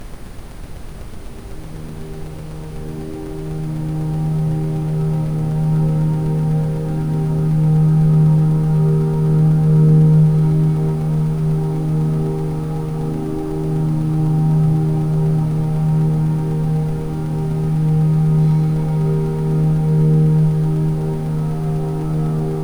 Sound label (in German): Rife Kreativ Katalysator mit Ambient Sound; rife frequenzen